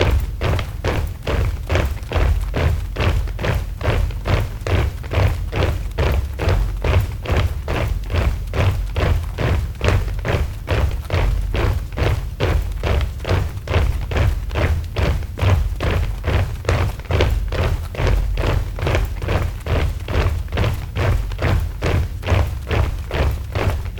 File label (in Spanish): Marcha Militar 140bpm